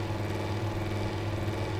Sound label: Battery Indicator-Machine On Loop; Sx Battery Indicator-Machine On Loop 01